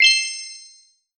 shine; easter

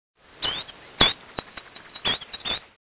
bird Twitter; Solitaire

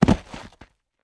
bodyfall human dirt

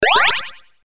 heal; patcheconwarsdx

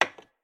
button click; pond5

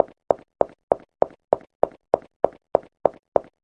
walk

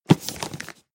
present wrap

